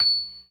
PERC, ORGAN; Keith Emerson Signature Series

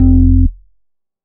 bass classic open F; Polyend; ARC Noise

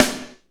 SNR, R&B Kits, Roland; Northstar - Drumscapes Roland; Wet Kit

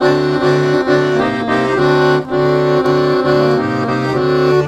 Balkanish Traditions; USB Soundscan vol.63; AKAI

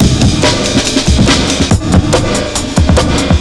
SPEED, Big Beat Drumloops

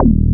PRO1 E02, Bass

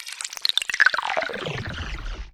Wet Droppa

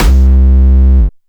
Distortion Kicks, Bassdrums

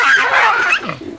WOLVES; Greytsounds VOL-1

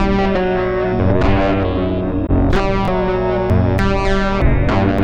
Dirge bass, Funk; Sonic Foundry (Sony Creative Software)

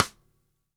DW Green Maple Cross Stick Room; Sonic Foundry (Sony Creative Software); Vital Drums

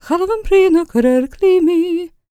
GAELIC, World Voices, GAELIC FEM

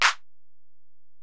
EPerc, Alesis DM-PRO

